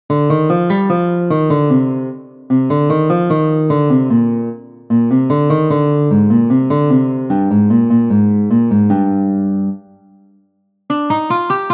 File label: For Guitar